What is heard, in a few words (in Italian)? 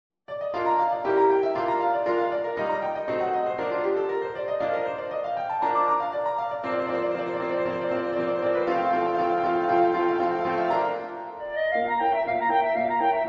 studio primo per clarinetto e pianoforte